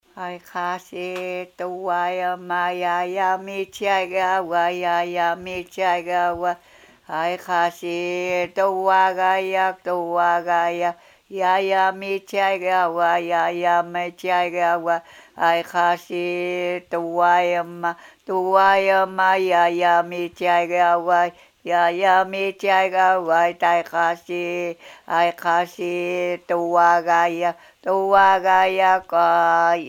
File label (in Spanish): qawashqar canto arjache; Kawashkar